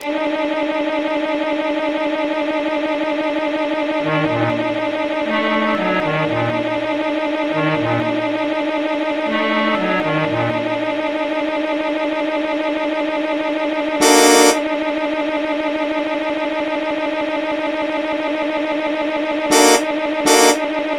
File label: Danger Barking